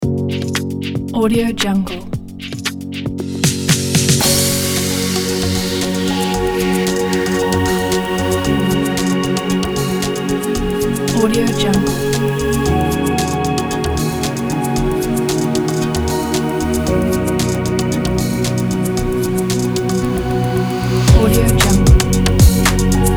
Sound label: relax trance